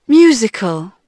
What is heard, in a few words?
musical; Dorling Kindersley My First Incredible Amazing Dictionary